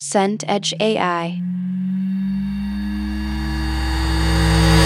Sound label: scifi power up long